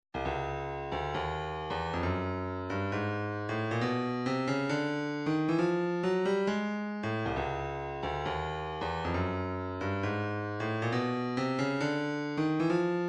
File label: 135 Post Kick, midi; Postal